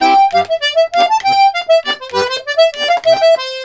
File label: BOUREE, Accordions; USB Soundscan vol.40; AKAI